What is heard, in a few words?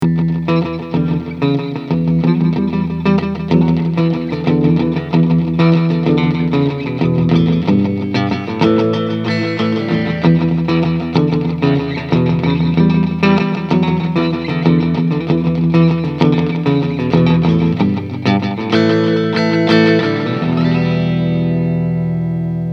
rhythm, Vox Time Machine; guitar